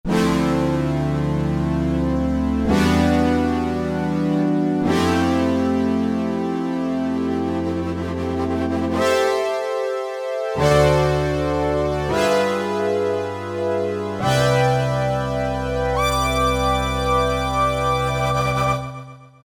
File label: Strate Saw